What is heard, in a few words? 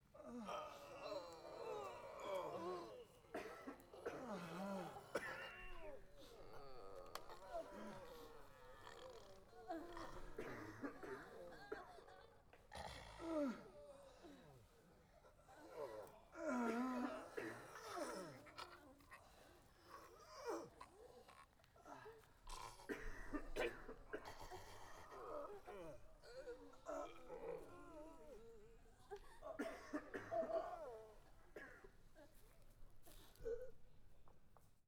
EXT GROUP Battle End Agony Moans, Battle Crowd; Coll Anderson